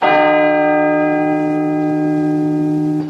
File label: bell toll big